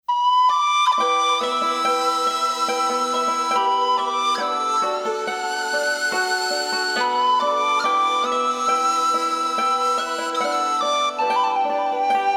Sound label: Celtic mix